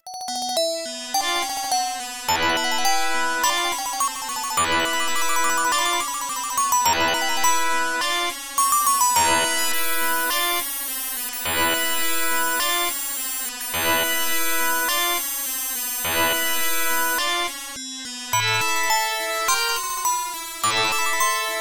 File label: Dungeon; u1-fujitsu